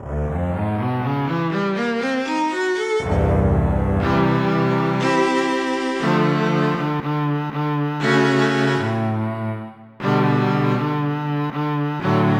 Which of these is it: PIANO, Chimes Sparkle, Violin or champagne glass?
Violin